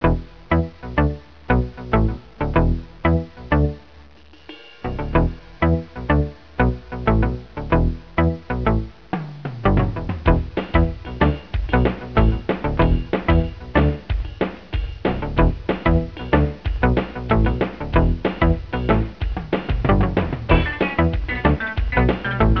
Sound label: funk or